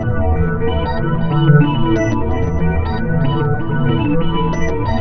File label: distract level pass effect; Earth and Beyond; EA Games